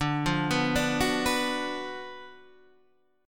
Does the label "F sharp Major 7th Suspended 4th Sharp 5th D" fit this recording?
Yes